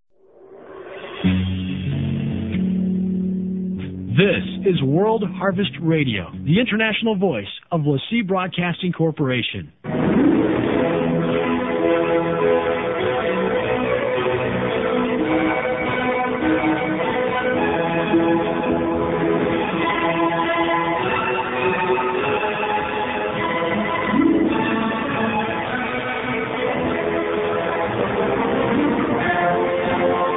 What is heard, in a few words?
interval signal; kwhr naalehu hi